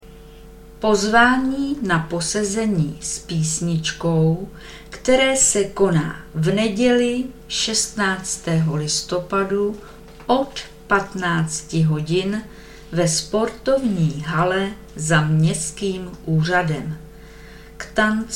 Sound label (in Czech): muzika